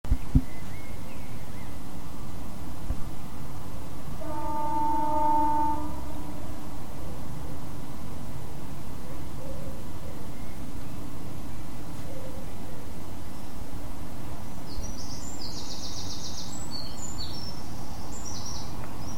summer birdsong birds with loud train siren wing flaps and loud bird